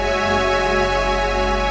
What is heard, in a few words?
lightgrid chord looping, synth